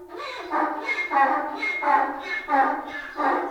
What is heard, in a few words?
Donkey; Little Zoo